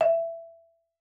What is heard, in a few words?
marimba E5; Xsample VOL-4